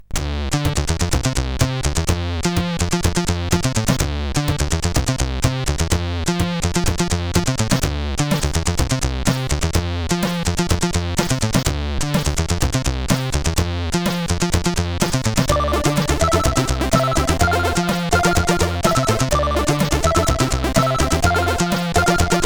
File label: Intro Jam, CSG8580R5